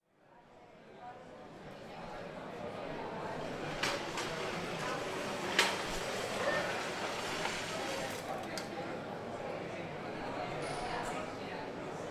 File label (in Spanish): plaza nueva - recogiendo sillas; bilbao; soundfood